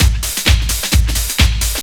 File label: drum 130 groove; Techno Trance Essentials